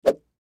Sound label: Whip Whoosh Swoosh Rod Stick; Sound Design; Blastwave; Foley